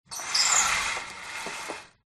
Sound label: close store; fox audio